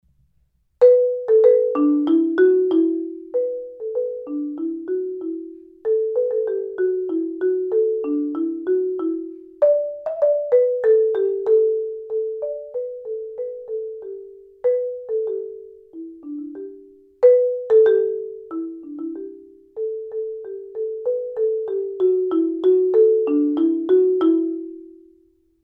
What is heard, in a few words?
mallets